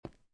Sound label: stone step; vectozavr-shooter